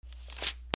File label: PAPER